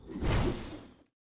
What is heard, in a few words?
Tripmine throw